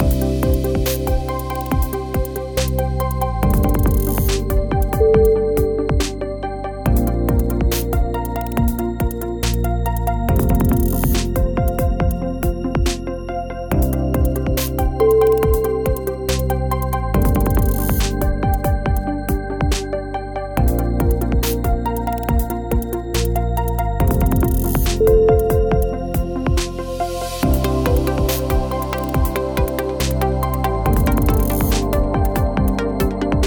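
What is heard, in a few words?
glitched presentation, music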